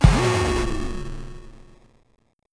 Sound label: weakened wail